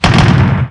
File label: tank fire